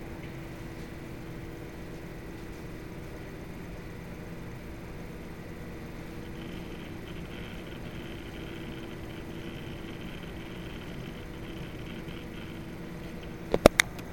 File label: ENGTX580DCII noise idle; ENGTX580DCIIreview